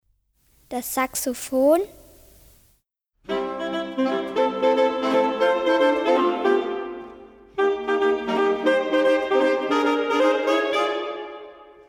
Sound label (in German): Saxophon; Bilder einer Ausstellung